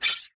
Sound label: sfx, futuristic weapons sound effects